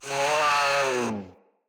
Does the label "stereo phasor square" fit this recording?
No